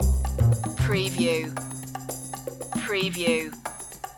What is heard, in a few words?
smooth approach loop